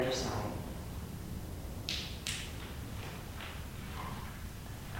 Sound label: clapping